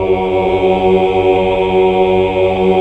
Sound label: HAL OOH OOOH Oct; Sound & Vision - Gigapack II CD 1 (Roland)